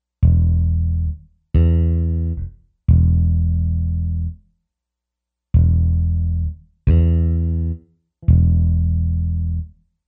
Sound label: bass